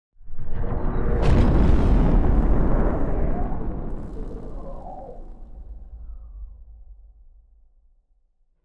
ship battleship move; ships; Stellaris; shroud

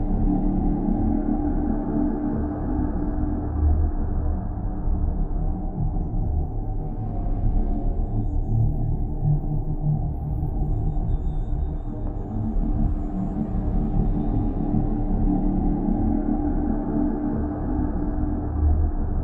ship habitat idle; Stellaris; machineage

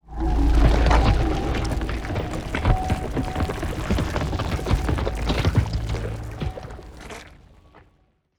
creeping coagulation; Stellaris; strange worlds

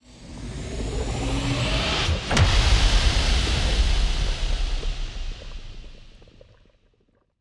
toxic megastructure hit, weapons; Stellaris; toxoids

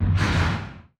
distance spikespore fire, weapons; Stellaris

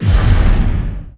distance tachyon lance fire, weapons; Stellaris

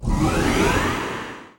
moving out colony ship; Stellaris

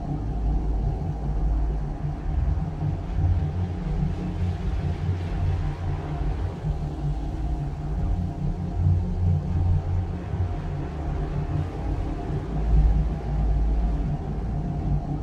ship construction ship idle; Stellaris; machineage; ships

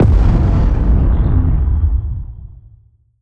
perdition beam fire; apocalypse

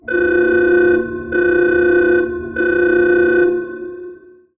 red alert; Stellaris

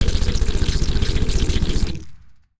weapon thorn cannon; Stellaris; grand archive; weapons

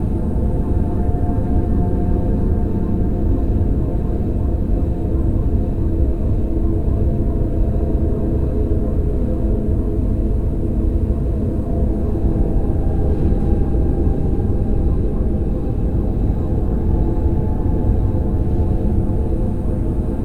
destroyer hum; nemesis; Stellaris

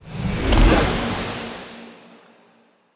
pro ship stinger move; biogenesis

